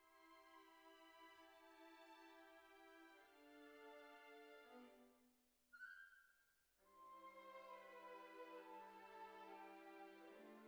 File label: Cbasses